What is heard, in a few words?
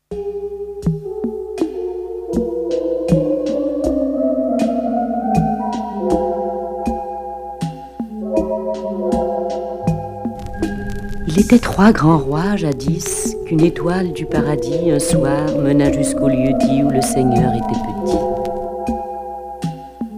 Flute